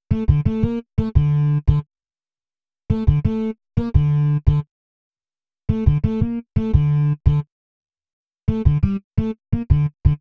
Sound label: Old Shizzle, Hip-Hop, 86